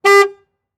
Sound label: Truck Ford Ranger Honk Horn; transportation